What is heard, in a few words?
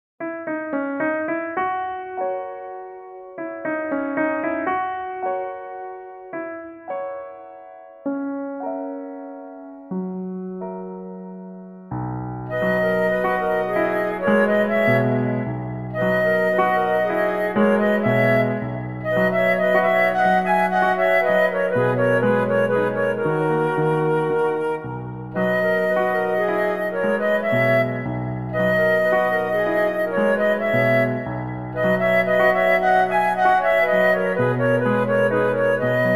piano 2 flutes